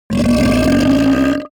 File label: creature yeti burp loud; smartsoundfx